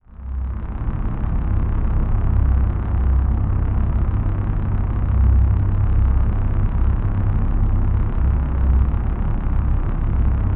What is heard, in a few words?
Drone Square Wave Synth Bed Reverb; Production Element Imaging; AdobeCC